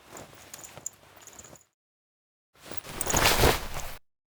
Foley; Cinematics; Drifter Lotus Operator Awakes

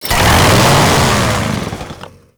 Saw Forward Combo Rev; Warframe; Kuva Ghoul Saw

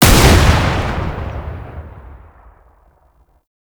Alt Fire Shot; Warframe; Scaldra AK

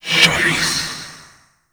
Grimoire Fire Whispers; Warframe; Tenno

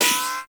Bullet Impact Ricochet; Weapons; Bullet Impacts; Warframe

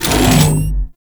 Devour Regurgitate; Warframe; Grendel; Grendel Deluxe

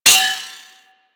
Shot Projectile Bounce; Disc Moa

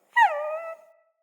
Wolf Cub Trapped Call Close; Animals; Wolf Cubs; Orengal Wolf Cub B

